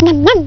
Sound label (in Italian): Gnam Gnam; Strategy; Maze; BabyLabyrinth 1.04